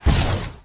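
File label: rocket launcher launch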